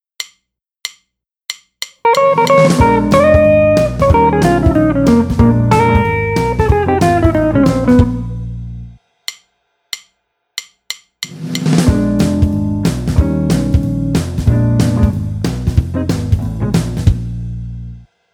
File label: Build Up